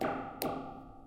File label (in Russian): zvuki metallicheskogo trosa (kabelia)